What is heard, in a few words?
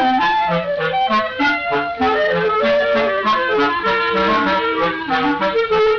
clarinet